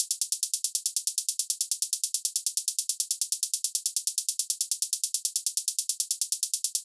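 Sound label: drum Loop 140BPM closed hi-hat; Vengeance - Essential Clubsounds Vol.5; VEC5 Drumloops; VEC5 Multi Loops 140BPM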